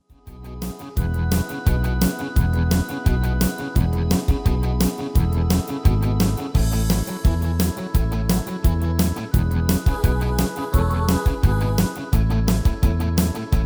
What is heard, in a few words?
MIDI